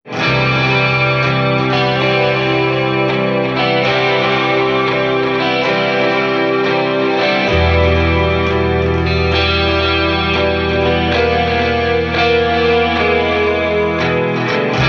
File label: Sad Forlorn Reverb Guitars only; CUES for film TV games etc